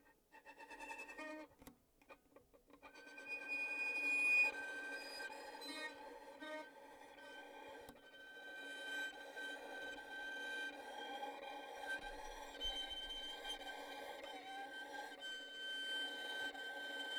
Scary Harmonic

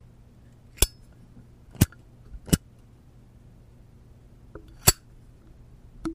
Zippo

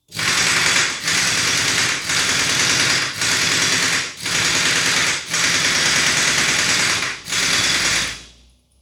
Machine Gun

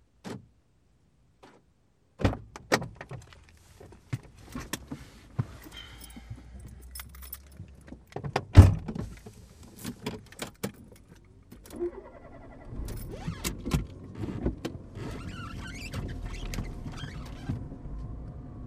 Fiat Palio Starting And Going Away

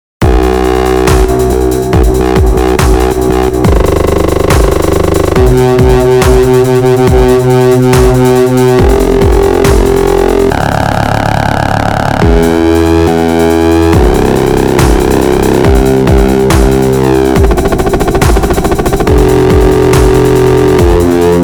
Dubstep Loops

